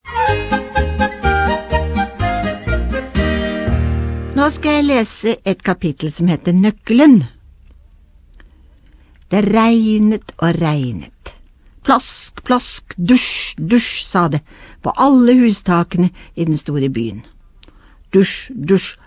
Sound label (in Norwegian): farten